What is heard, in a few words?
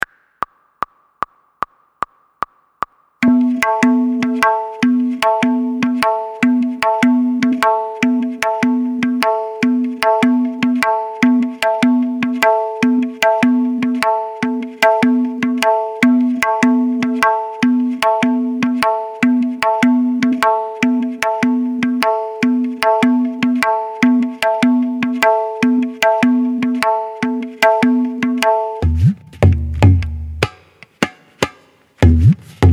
Bhajan Keherwa Practice